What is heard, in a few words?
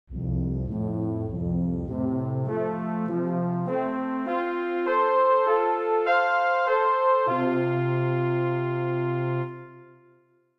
french horn